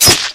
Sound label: flechette flesh impact; npc; source engine; ep2; ministrider